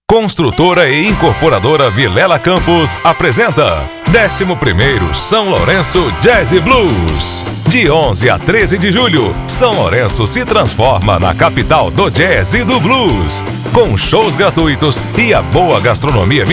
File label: Jazz Blues; Sao Lourenco